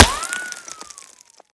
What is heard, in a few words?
default, bullet collide; STALKER; IWP